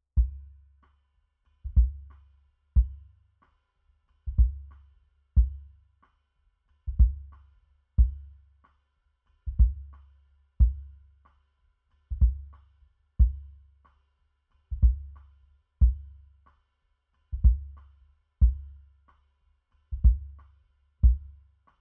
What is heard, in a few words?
kick sub